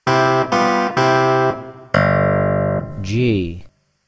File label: G0 Clavinet Major; ear training instrumental color